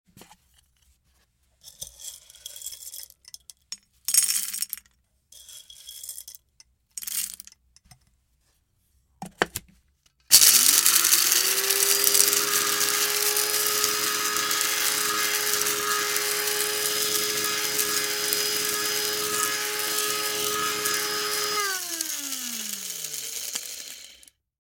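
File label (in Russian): звук кофемолки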